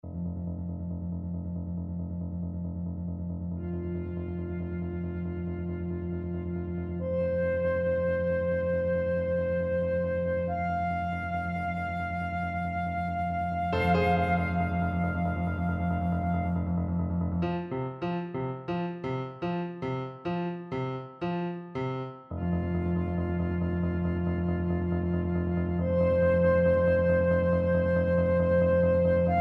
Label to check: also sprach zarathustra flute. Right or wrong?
Right